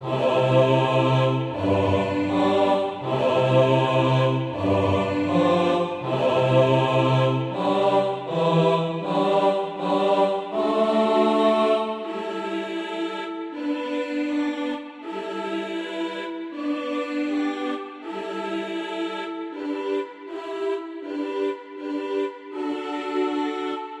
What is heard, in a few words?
bass